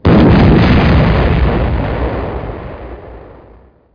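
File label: sound explosion; MOTS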